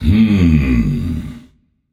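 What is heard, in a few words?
non verbal thinking, voice; cernunnos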